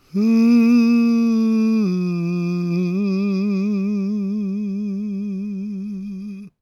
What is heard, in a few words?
GOSP MALE, SOLO; Spectrasonics; Vocal Planet; Gospel